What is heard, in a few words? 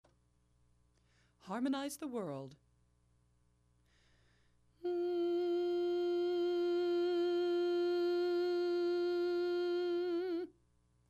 Harmonize lead alone